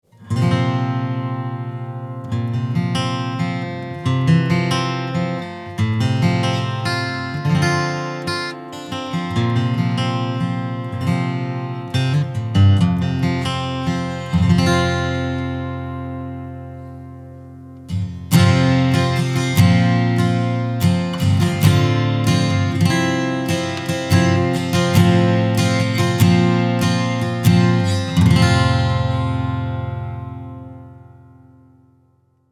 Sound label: Acoustic Mic